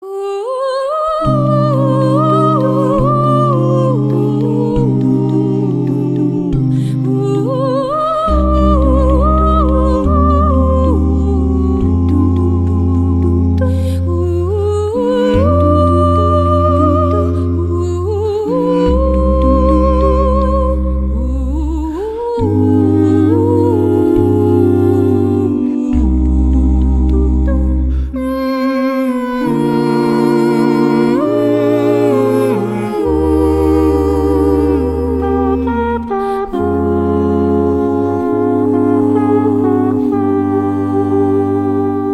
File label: Acapella; The Godfather